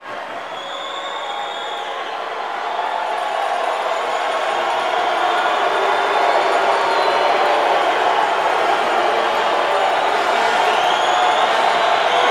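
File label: basketball crowd jeer; Crowds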